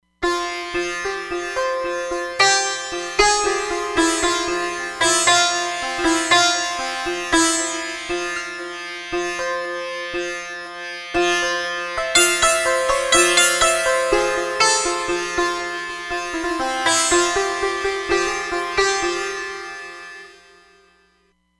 Sitar, Roland Fantom XR

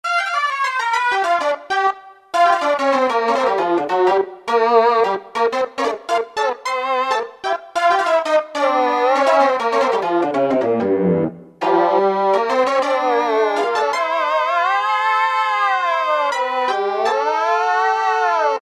Ethnoo Lead; Kurzweil K2000 Orchestral ROM

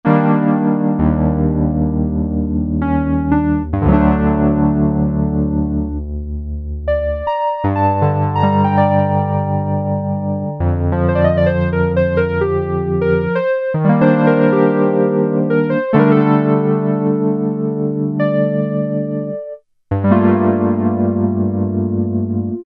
ELCPIANO, Kawai SX-240

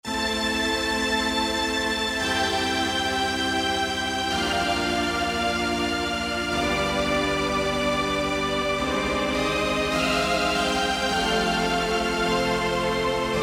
Old Strings, Roland M-DC1

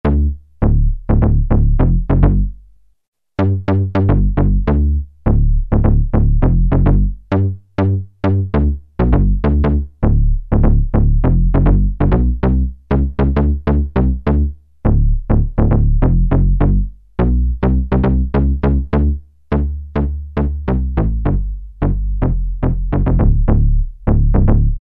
HOUSE BASS

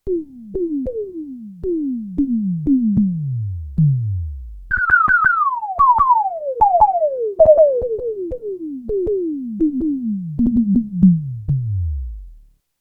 SYNTH DRUM; SYNTH DRUM III

